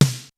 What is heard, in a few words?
XR-10 K+SN+H, drums